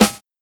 present snare a key, steel snare drum tuned to the A note. Loudest frequency: 2308Hz